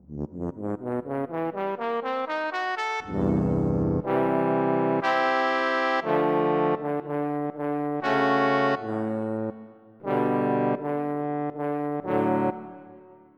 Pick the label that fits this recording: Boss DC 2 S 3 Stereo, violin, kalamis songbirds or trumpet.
trumpet